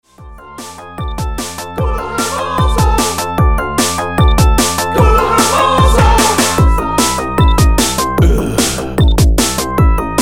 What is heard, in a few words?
electronica